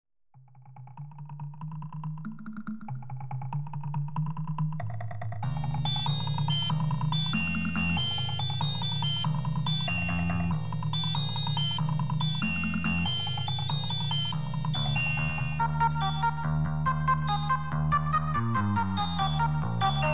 radiophonic music; bbc radiophonic workshop

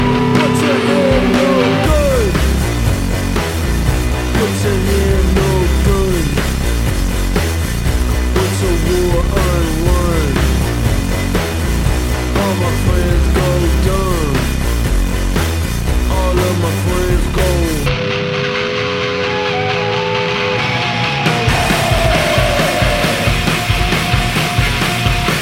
death metal

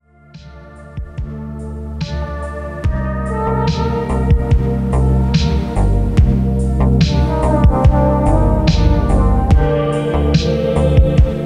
instrumentals

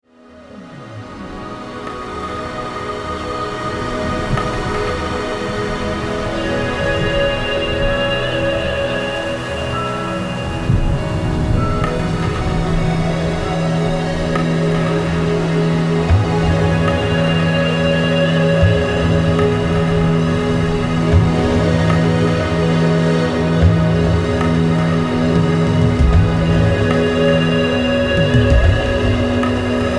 birds and flutes

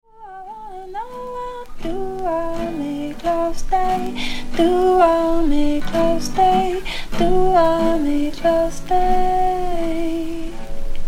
strummin

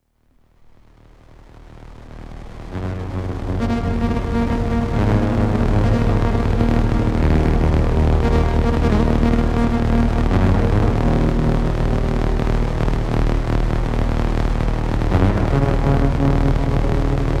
snare

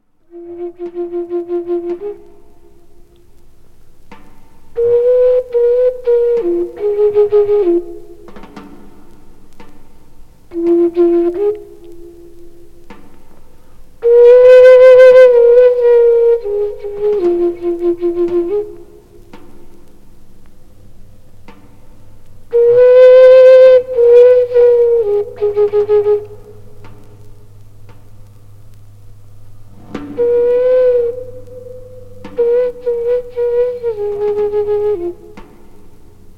bamboo flute blues

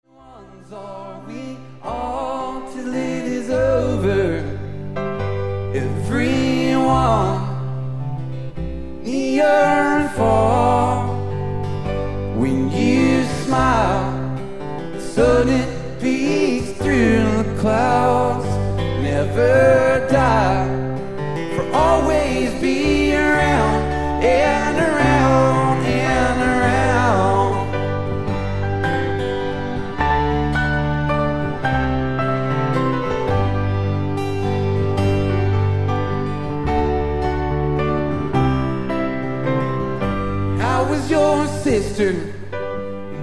acoustic

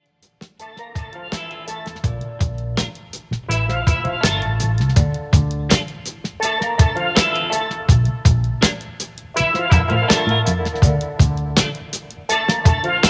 steelband

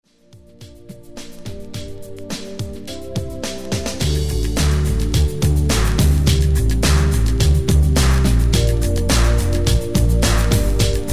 electronic

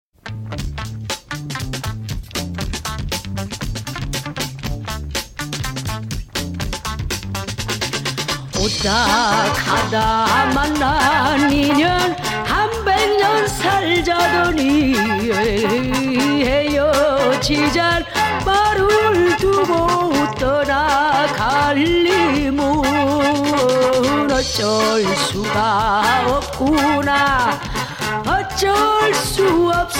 funky Korean soul

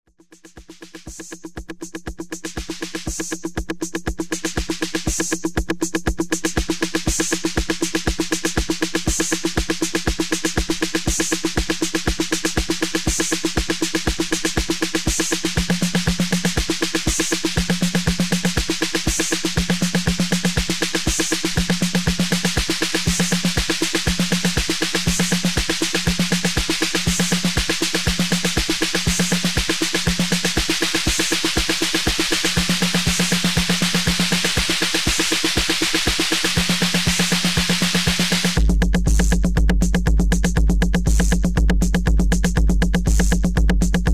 drum machine

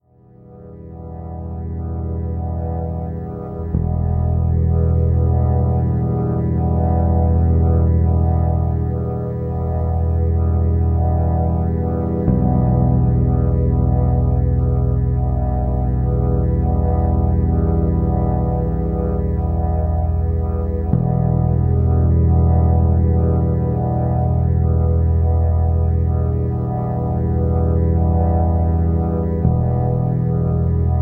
beat

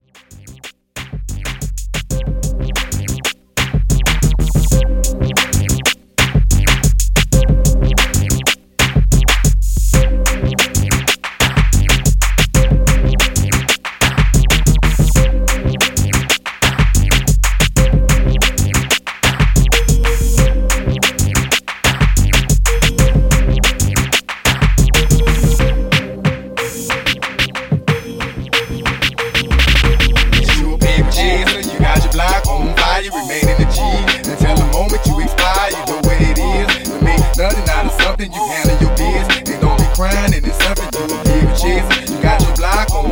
instrumentals

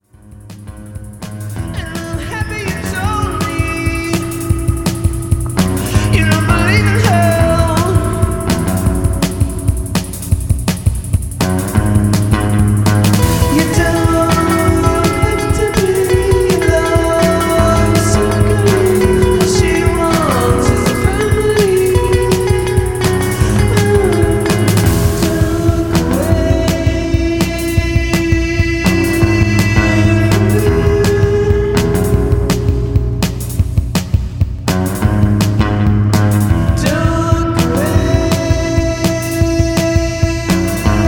drums portamento

